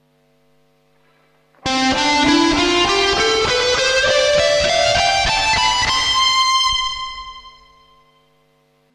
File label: reverb, guitar effects